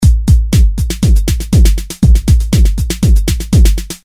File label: loop rithm kik delayed; Sound Graph